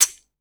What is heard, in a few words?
shake; foxdot default